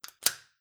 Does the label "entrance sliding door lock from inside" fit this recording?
Yes